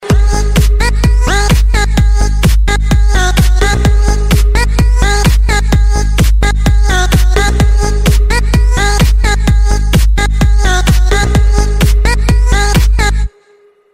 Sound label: Ringtone; ringtones